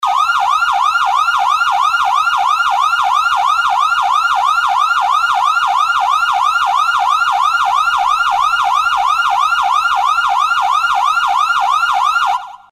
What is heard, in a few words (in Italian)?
polizia americana, suono della polizia americana